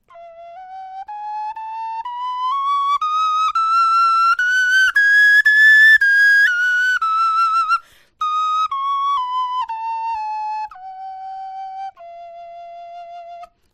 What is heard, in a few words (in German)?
tonleiter